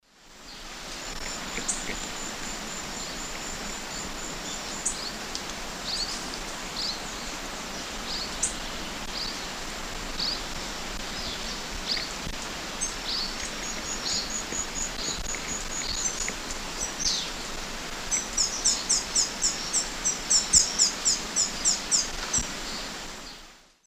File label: Thlypopsis sordida, calls and song; S. America; Eastern Lowlands